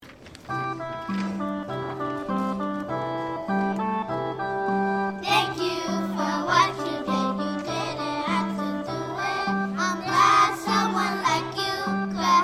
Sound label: chor